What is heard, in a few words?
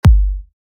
Kick